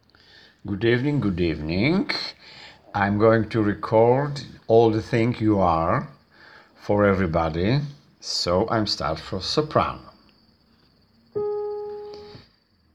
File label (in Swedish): sopran